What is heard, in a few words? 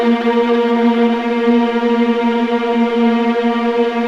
VLA A#1 TRM L, VLAS TREMOLO